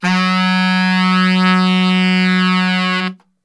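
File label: F# 1X SAX STR, saxophone; Best Service; Brass Super Section; SAX STRGHT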